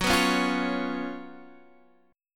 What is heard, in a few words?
Gb Major 7th Suspended 4th, Guitar Half Step Down